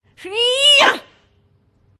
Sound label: human female attack voice